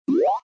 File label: UI Open